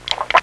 SIP OF WTR

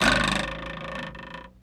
BING, cartoons

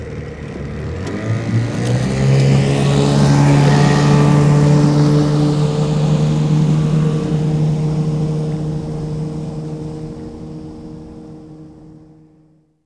10 HORSE AWAY, navi; trasporti

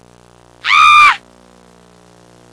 SCREAM; urla

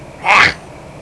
"UGHH"

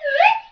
cartoon split

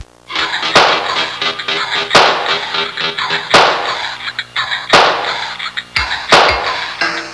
industri

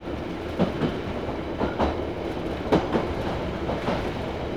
TRAIN; treni

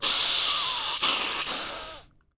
BLOWING; persone

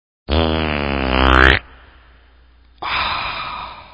Fart Aaah, cartoons

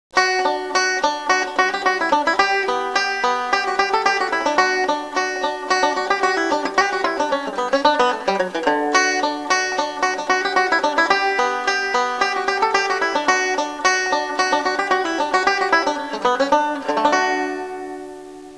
suonerie musica traditional usa country; american

